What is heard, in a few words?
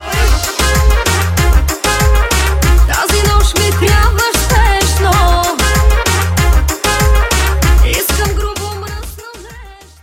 win, music